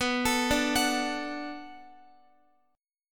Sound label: B Minor 7th B Guitar, chord; Bm7 arpeggiated